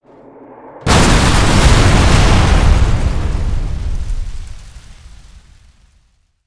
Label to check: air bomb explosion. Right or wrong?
Right